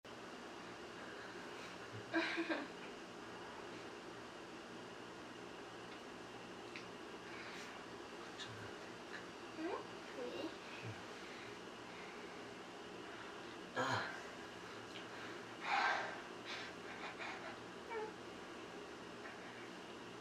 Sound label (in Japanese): ディープキス